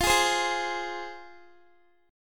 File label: F Minor Guitar, chord; strummed; 4E,4A,5D,5G,5C,6F